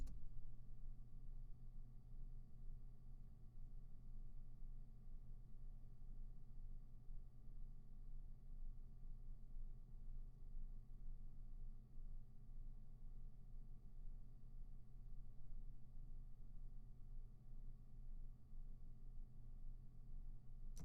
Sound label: ROOMTONE Park